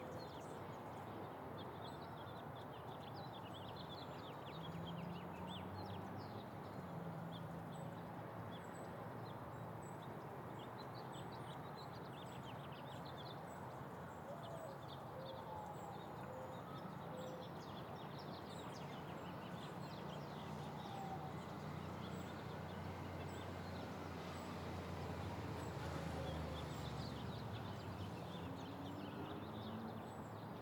Day Park Empty Playground Distant Traffic Siren Heavy Birds; The Park